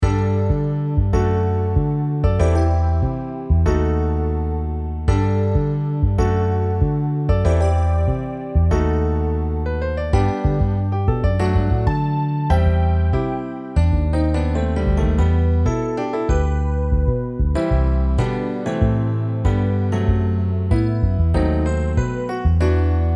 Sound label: Piano Bass